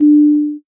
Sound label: ding